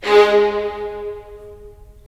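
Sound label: strings violins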